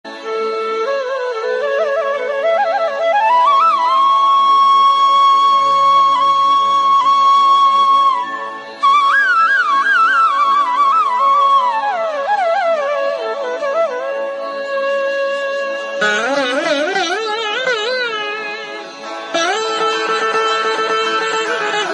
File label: instruments; Religious